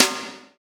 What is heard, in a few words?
Kawai ACR-20 SD